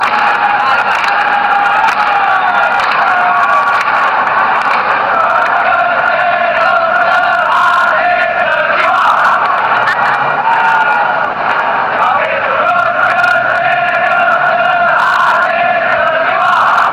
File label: ultras anthem, chants; malaya